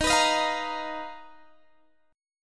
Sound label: B Major 7th Flat 5th Eb